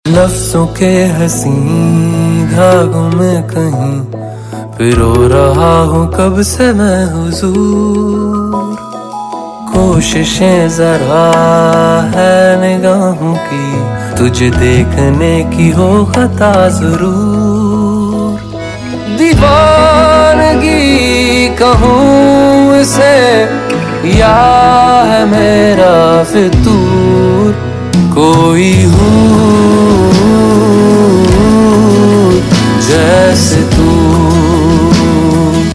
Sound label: Ringtone, Bollywood; Hindi Medium